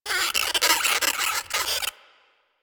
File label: Forest Rodent Squak; Warframe; Ambience; Grineer Forest